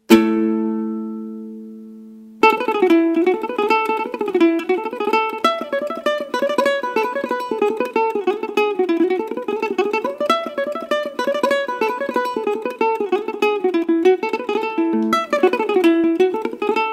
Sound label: Ukulele